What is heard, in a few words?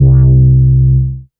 C3, BASS